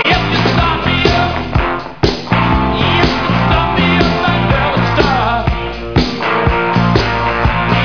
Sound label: loop